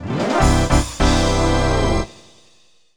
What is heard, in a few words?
Battle Start Fanfare; Mario Kart 64